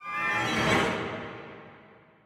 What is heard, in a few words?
thrill; the wolfs night